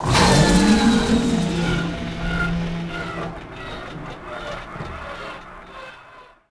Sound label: turbine Drone shutdown; machines mechanical